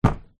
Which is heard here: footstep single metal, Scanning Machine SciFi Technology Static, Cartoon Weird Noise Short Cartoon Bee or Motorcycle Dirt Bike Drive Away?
footstep single metal